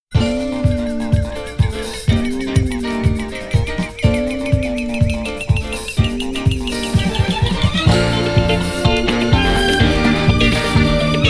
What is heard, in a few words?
Sax Caribbean Disco